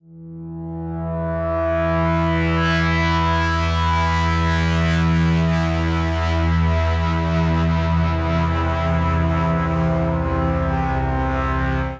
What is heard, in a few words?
Bass Em 80 Bpm; MIDI Clips; Instruments; Callous; Ableton; Drone Lab